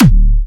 Springer D4, Big Room Kicks

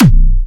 Springer - B3, Kicks, Big Room Kicks

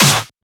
SnareClap; FLPRO

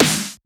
Snare; FLPRO

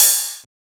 Ride; FLPRO

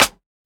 Perc, Percussion; FLPRO

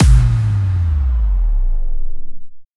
Kick Stop

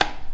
Perc, Percussionz

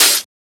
Open Hat; FLPRO

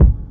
Kick

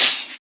Open Hat; FLPRO

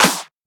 Shifted Clap; FLPRO

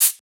Shaker; FLPRO

